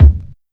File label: kick sample d key